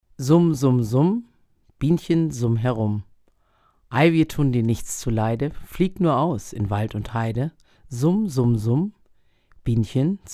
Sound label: Vox close cardioid GA 47